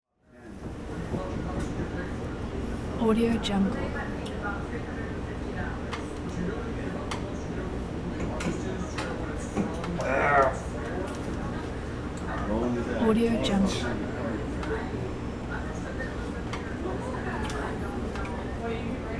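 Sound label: bar interior close up crowd walla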